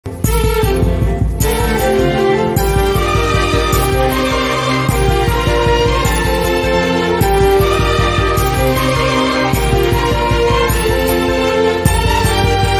amma amma telugu bgm ringtone telugu movie bgm ringtone